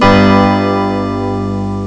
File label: kawai synth; Amiga Soundtracker Sample Packs